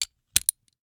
Rachet, vanadium steel, 8in, standard, locking, clicking, mechanical, new, socket lock, rotate; Eiravaein Works; Vaeyan IV